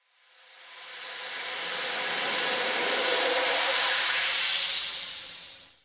Reverse

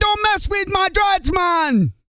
REGGAE, Vocal Planet, World Voices; ILIO; Vocal Planet VOL-5

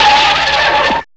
SCREECH; M8; CMI Factory

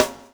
SLICK, Backbeat

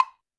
percussion, Roland TD-20

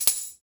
Tambourine, Percussion

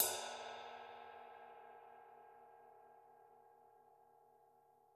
RIDE, Drums Percussion

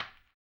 HAMBONE, Vocal Blues Soul; Spectrasonics; Planet

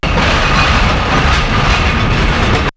The Crusher; X-Static Goldmine

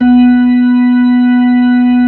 B-3

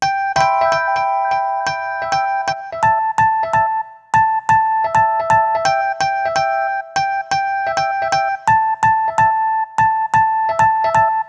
E Piano, hip-hop; Sonic Foundry (Sony Creative Software); Hydroponic Hip-Hop; Keys Synth; Pianos

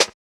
Snare, JUNGLE BREAKS

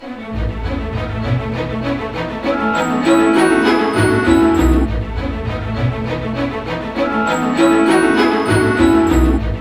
BIKE; East West; Scoring Tools; ORC; Bike Ride A

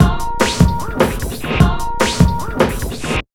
150, Big Beat Drumloops

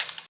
HH, Drum & Bass, 160 BPM

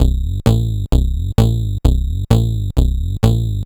Sh101 Loop, 130BPM

